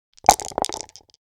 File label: Dice shaking closed plastic cup